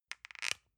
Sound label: lotion lid open soft; berufe; friseur